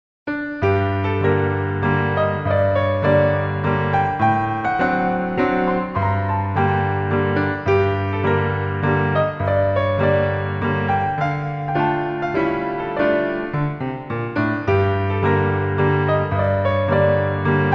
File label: Mazurka G Moll